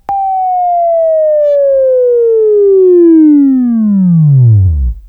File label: Buzz; Labview-Program; Sweeping Training program